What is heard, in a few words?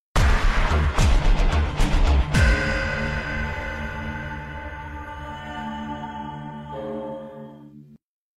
zombie mode round end sound; cod waw